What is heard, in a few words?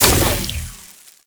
Tentacle Proj Die; Warframe; Proto Infested; Ancient; Abilities